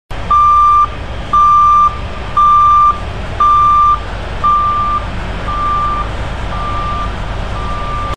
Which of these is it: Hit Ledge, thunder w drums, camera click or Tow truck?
Tow truck